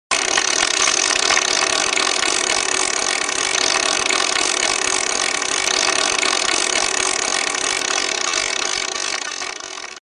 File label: rotate; forestparty